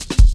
Rollin Shaker Cut, Drum Loops 166.5; Zero-G Jungle Warfare 1, 2 & 3

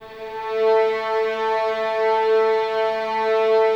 violins A 3, string ensembles; Miroslav Vitous

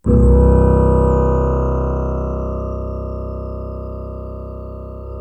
T WAVE, Synth Waves, Techno Trance

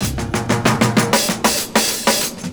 FILLS, 70_s Breakbeats

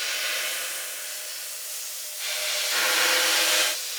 Sample n Hold Verb Loops, sparse soundscape

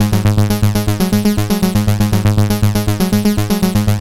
Progressive Synth, Trance

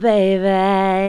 Baby 1; Mo' Phatt; Digital Sound Factory Mo' Phatt

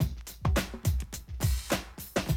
Classic DrumLoops

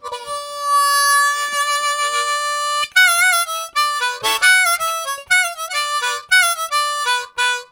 Whaling, Harmonica Riffs; Zero G - Harmonica

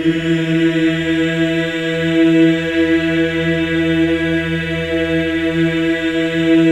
E4, Symphony of Voices men; MEN EES OCT

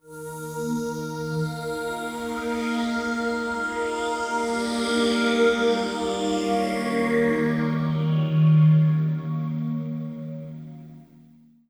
PAD, Hip Hop and R&B; Best Service; ProSamples vol.01; AKAI

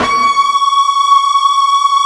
FUZZ C#5, Guitar; ULT FZZ LOOP; East West; Steve Stevens Guitar Samples Collection

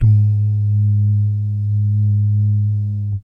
BS DUMM, Vocal Planet Jazz & FX; ILIO; BASS DUMMS

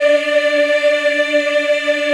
D4, Choir Acoustic & Synth; USB Soundscan vol.28; AKAI

